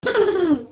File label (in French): rire diablotin